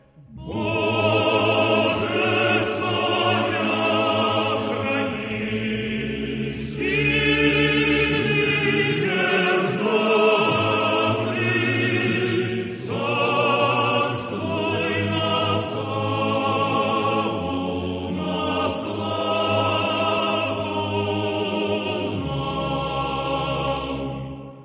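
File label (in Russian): хор; valaamskogo monastirya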